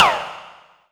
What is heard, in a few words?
verbsnare; kidGlitch; Ableton Foundation FreePacks; Wicked Kits